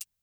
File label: button Over; netent